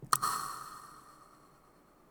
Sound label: banished sound, magic and spell sound effects